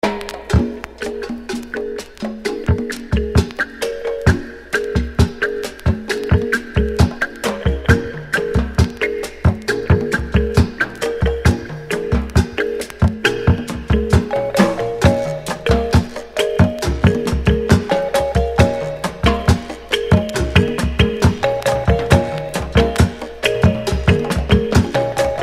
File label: Drum Song